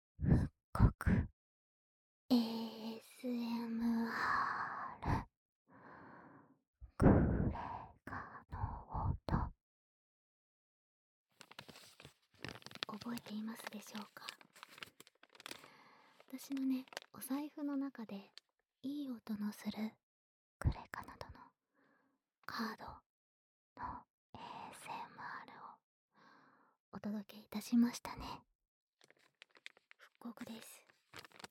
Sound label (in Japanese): ASMR クレカの音